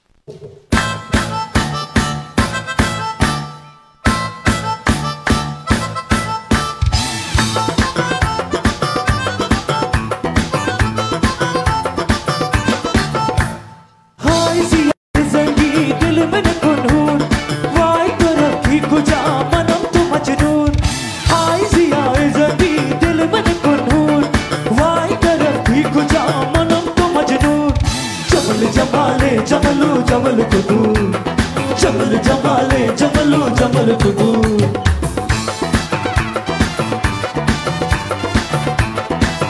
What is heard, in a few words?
HINDI SONG; CHOCOLATE CARNIVEL LIVE IN MADURANKULIYA